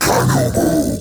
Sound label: Cast Vocal; Warframe; Uriel; Demonium